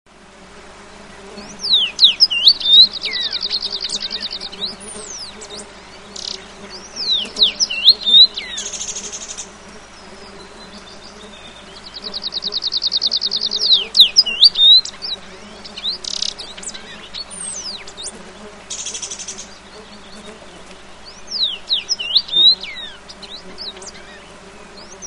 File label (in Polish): dziwonia na tle innych ptaków